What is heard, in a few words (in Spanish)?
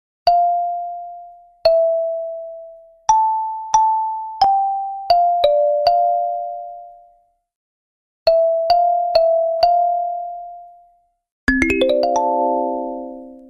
Un audio relajante por si quieres de tu alarma; Avocados Channel